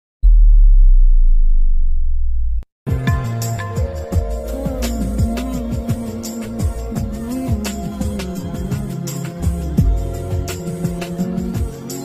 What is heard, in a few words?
instrumental music slow music bgm ringtone; Ghajini